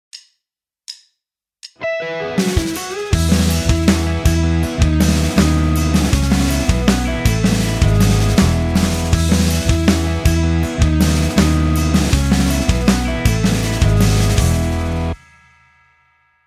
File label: guitar